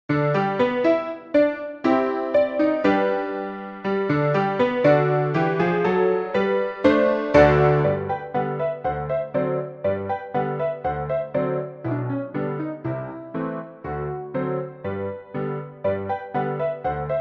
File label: piano